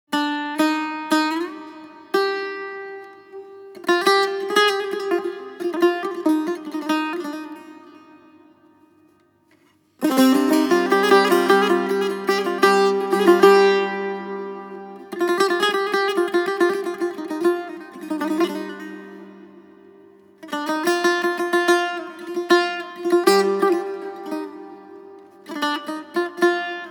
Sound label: Setar Solo